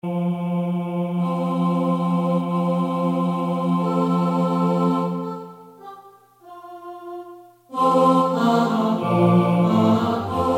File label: TENOR